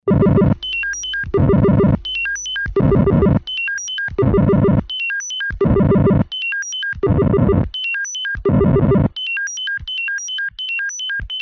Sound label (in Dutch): Semafoon; riedel